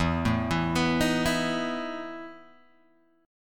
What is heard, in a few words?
E Major 7th Suspended 4th, Guitar, Open G